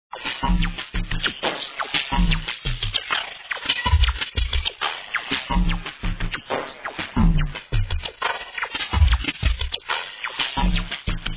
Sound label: beat; crusherx